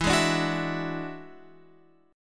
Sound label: E 9th E down Guitar Open F; E9 strummed; JGuitar